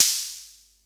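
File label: SR95 snare drum; Best Service; Dance Mega Drums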